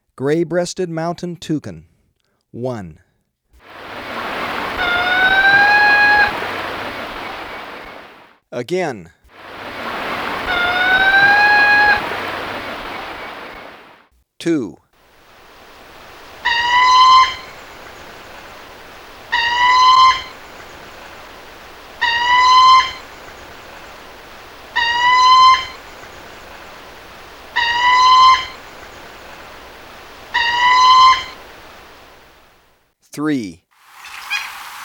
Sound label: Gray-Breasted Mountain Toucan; S. America